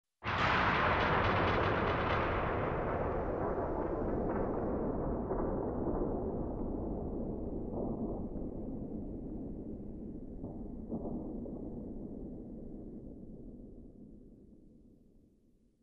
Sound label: Bomb Explosion